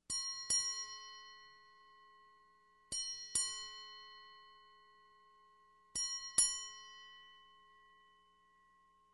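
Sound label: ship's bell 3 strikes; Колокольчик корабельный; три удара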